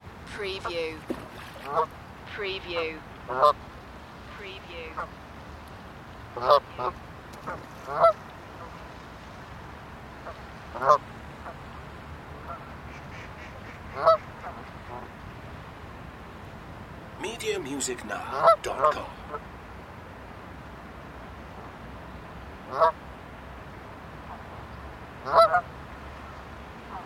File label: ducks quack